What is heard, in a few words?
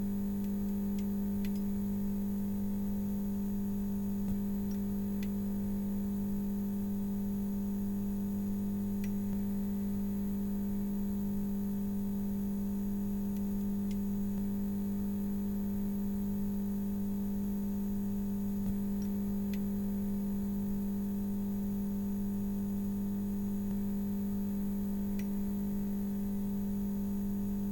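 Environment fluorescent lights; Poppy Playtime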